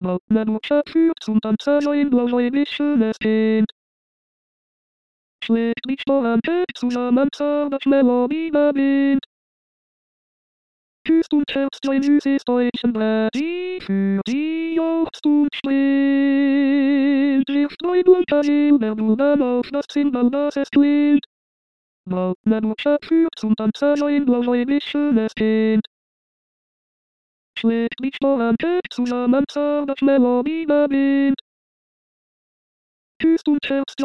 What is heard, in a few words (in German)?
Zigeunerlieder ténor